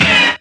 Synths A#; Vengeance Samples

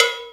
Percussion; Vengeance Samples; VEH2

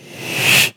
Sweeps Short

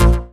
Synth Shot; Vengeance Samples

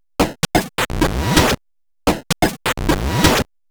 Minimal Loops

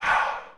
Chant

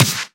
Claps & Snares

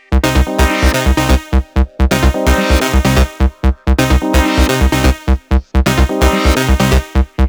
Melody 128 BPM Root C; Vengeance Samples; Melody Kits

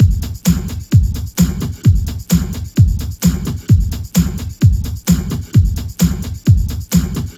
130BPM Kit; VFH1 130BPM Remnant Kit

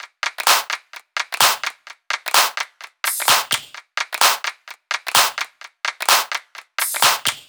128BPM Big Floor Groove Kit; Vengeance Samples

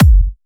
128BPM Kick; Vengeance Samples; Zoo Kit

